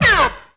lightsaber deflect laser; weapons

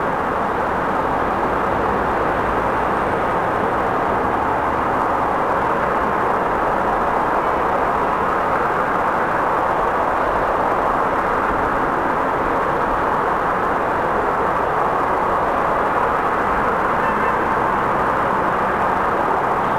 Edinburgh day, Ambience; Project Gotham Racing 2

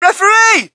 crowd, shout; Mike Tyson Heavyweight Boxing

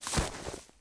body Get Up dirt

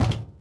footstep walk hollow metal

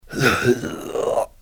groan; Soldier Of Fortune II Double Helix; XBOX; mullins

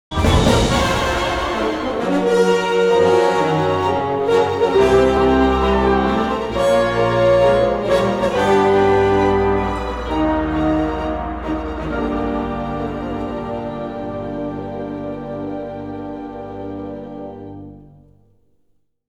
Ramp Down, music

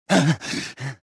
choke; reborn

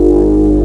whale, ships engine; Quantum Redshift; XBOX; kaitlyn

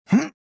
jump1; prisoner

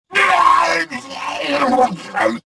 death2

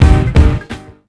powerup

